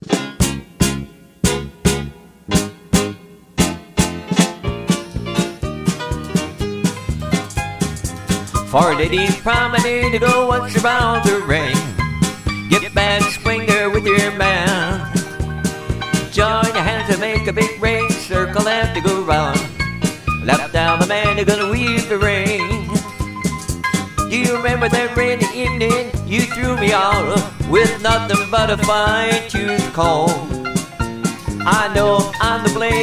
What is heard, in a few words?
vocal